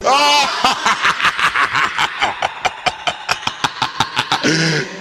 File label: laugh demon